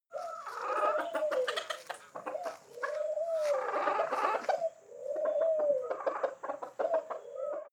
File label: hens and pigeon; natur-tiere; huehner